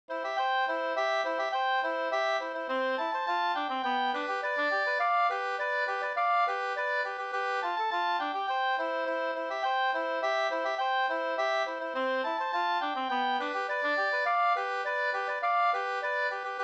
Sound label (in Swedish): polka, 2st